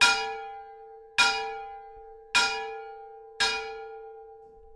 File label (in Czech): umiracek; machov